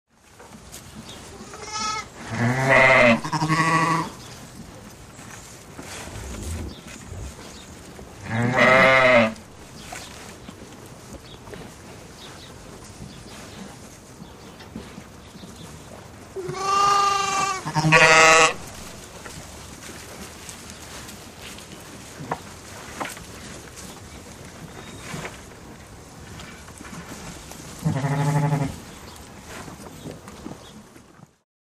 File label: Sheep Bleats Group